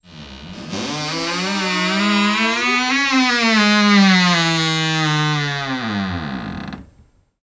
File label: Closet Door Creak